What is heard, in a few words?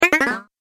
Arcade Game Down Bleeps; Video Game Sound Effects; Retro Inspired Sound Effects - Think Nintendo, Atari, C64, Etc.